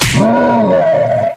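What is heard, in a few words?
free spins grunt hit heavy; wild worlds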